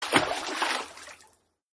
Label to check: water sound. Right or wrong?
Right